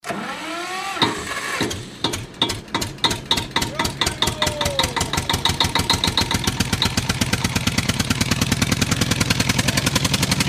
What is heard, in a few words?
tractor ringtone